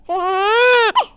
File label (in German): Babyschrei Franzoesisch